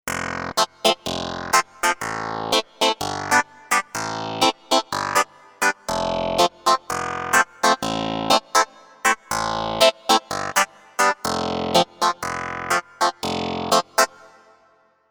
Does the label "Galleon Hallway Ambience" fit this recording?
No